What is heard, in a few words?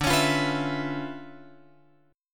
D Minor Major 13th